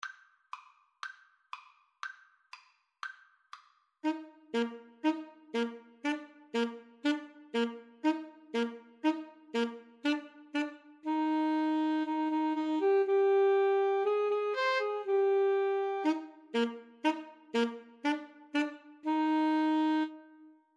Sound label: camptown races 2 A SAX